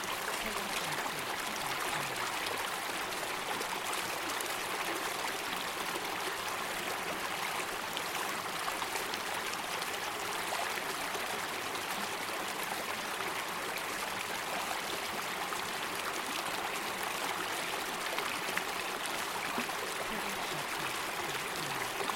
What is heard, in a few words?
loopable stream medium; soundscrate